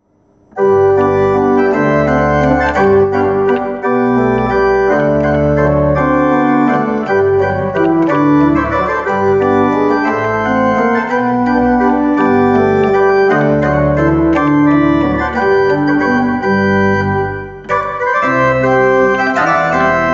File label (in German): Walzer in G